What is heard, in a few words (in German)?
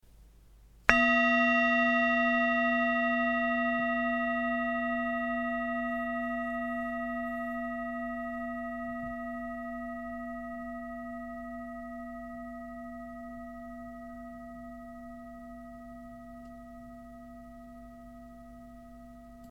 Klangschalen